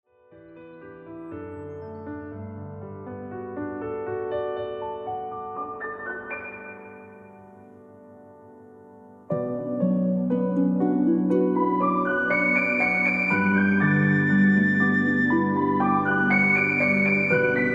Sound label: Dawn Chorus